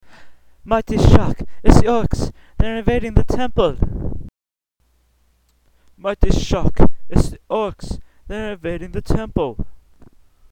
Orcs; SourceForts

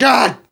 death; Sourceforts2.0; player

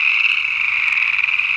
swamp stereo frogs loop; canals; hl2

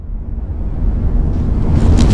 teleport preblast suckin; hl2

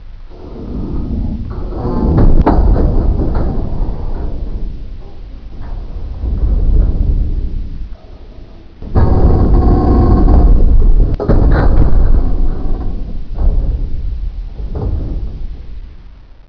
ship groan; hl2

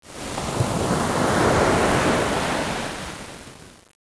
wave, water

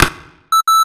hook, weapons Beacon

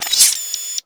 blades in, roller mine; hl2

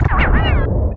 ladder sound; SF; kakujitsu

